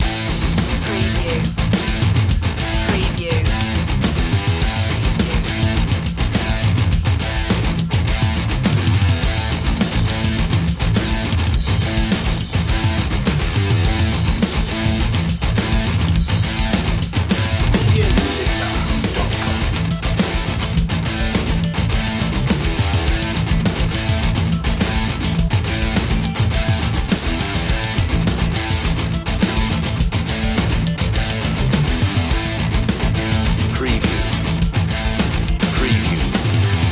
melee master loop

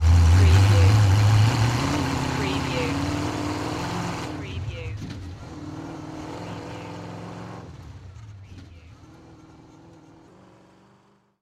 Chevy V8 Hot Rod Drive Away

